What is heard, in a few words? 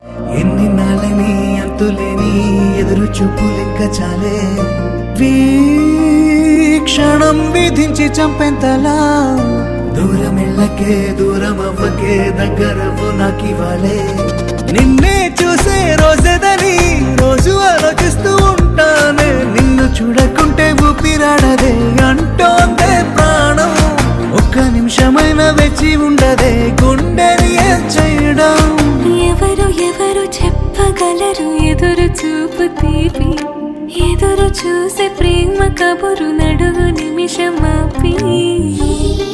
Ringtone